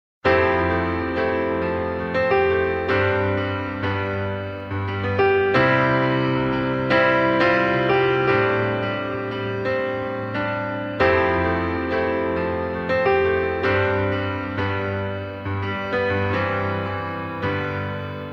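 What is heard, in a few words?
instrumental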